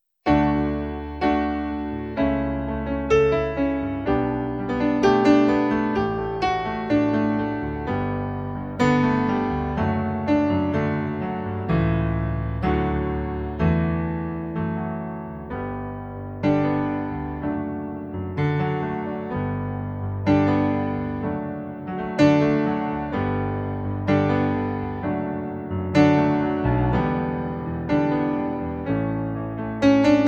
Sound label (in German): D Dur 63 Bpm